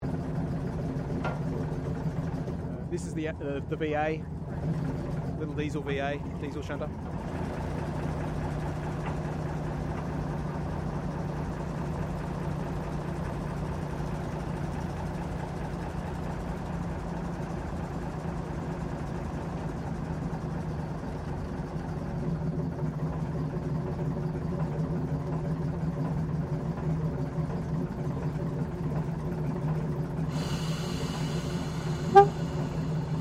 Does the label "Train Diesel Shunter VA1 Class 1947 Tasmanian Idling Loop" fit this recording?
Yes